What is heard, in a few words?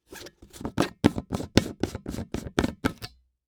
Household Container Unscrew Container Lid Fast